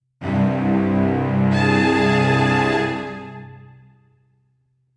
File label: strings; undead overlord linux macos windows gamerip 2014